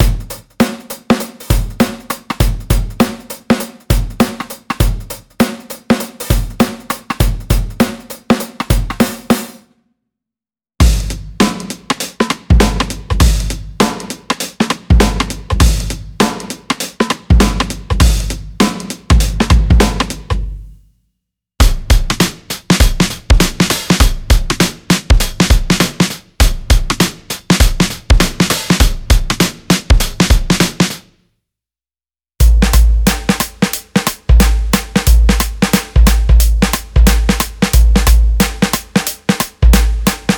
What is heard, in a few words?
Funk